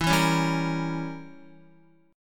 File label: E Diminished 7th E, Guitar; Edim7 strummed; JGuitar